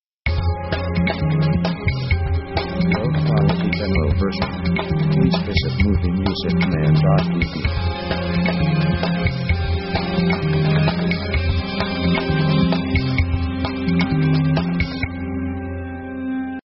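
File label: pop orchestra dramatic; synth themes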